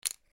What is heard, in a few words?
roulette bet